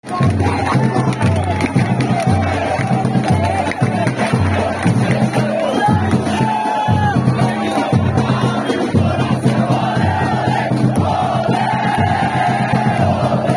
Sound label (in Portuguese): eu canto sou avaiano ate morrer, fanchants